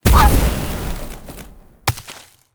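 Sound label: Humming Bird death, monster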